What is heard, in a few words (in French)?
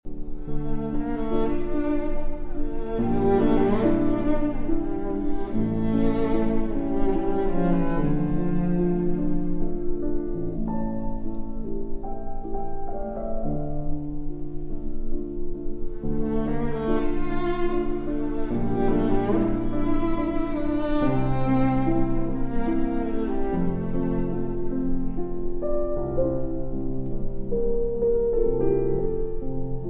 standchen pour violoncelle et piano; SCHUBERT